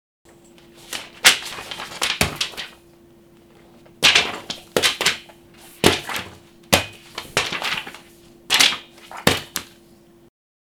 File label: paper